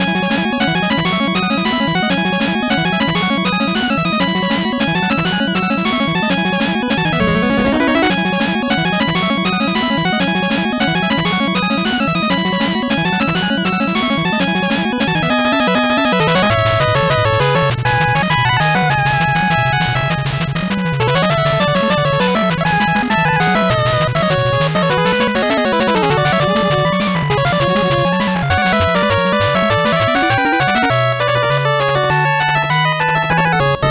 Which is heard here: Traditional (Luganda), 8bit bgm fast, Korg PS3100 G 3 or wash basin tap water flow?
8bit bgm fast